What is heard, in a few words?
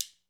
GHANA SHK; World Combo